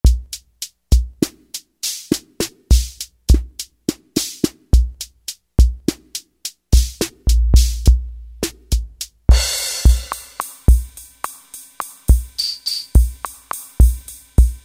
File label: Kit; Vintage Synthesizers And Classic Beatboxes